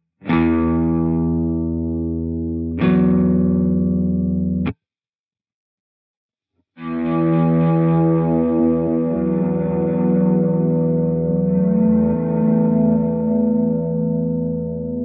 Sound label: Ambient Triggered Multi Swell